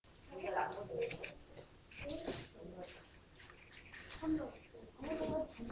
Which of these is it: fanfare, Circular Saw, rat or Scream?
rat